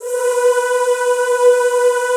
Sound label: ZZZ B3, Classical Choir; Best Service; Extended Classical Choir